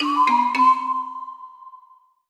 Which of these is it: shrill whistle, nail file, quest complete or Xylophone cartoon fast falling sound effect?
quest complete